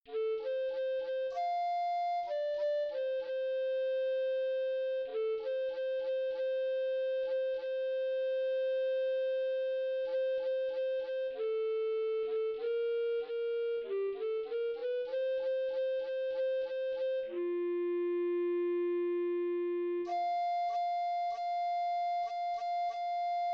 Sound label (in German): Bassflöte F